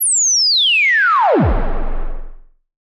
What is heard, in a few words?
Simple OSC Spring Reverb